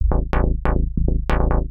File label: TECHNO BASS 140 A